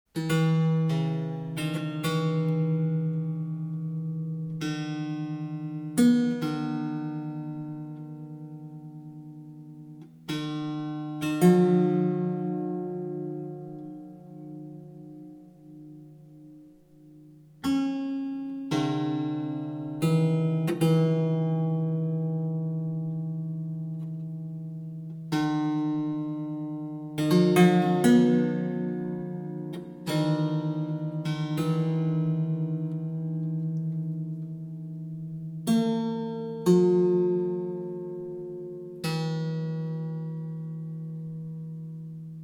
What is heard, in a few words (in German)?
monochord